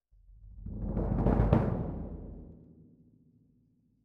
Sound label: Cassa Close